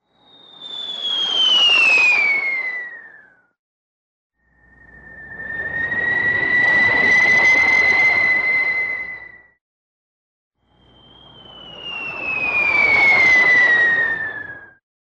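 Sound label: whistle sound of a falling rocket for editing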